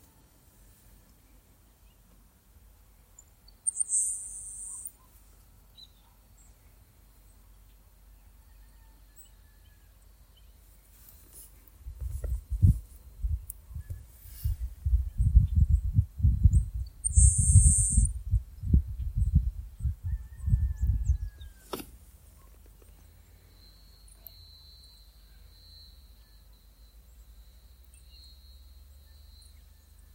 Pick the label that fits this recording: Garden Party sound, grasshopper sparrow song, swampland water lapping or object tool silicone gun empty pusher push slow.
grasshopper sparrow song